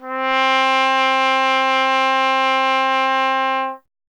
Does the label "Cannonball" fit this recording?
No